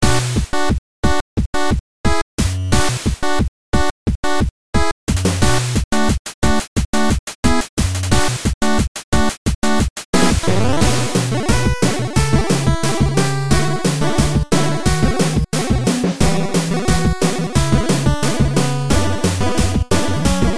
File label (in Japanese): 戦闘 8bitアレンジ; RTP